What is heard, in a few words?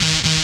Times Stab; M8